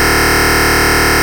noise, NES; MIDINES

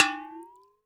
WATERBEL, WATERBELL; Univers Sons - Raricussions

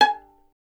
STR VLN, Solo Instruments; Miroslav Vitous

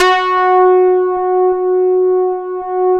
MATRIX 12, OB Synths, Analog; E-MU Formula 4000 Series Vol. 3; Analog Odyssey

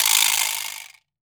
vibra slap; Cuba

